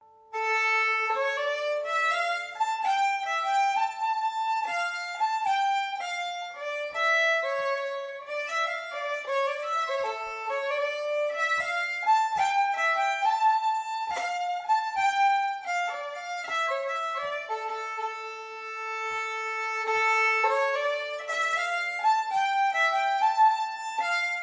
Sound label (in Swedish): Storhurven langsamt